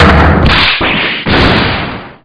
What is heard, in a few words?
dance angry; souseki